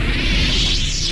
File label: FUTURE; Best Service; Advanced Mega Effects